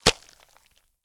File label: GORE Stab Splat Only; mono; GORE & SPLATS; Universal Sound FX